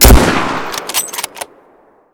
L96A1 Shot; Angry Goy II; SoundEffects

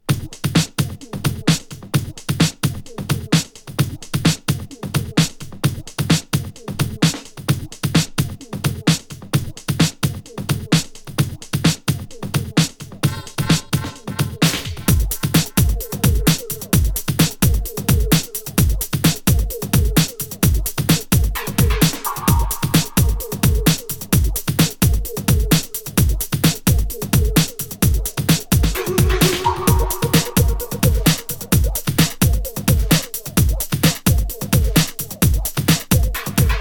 Vocal Club Mix

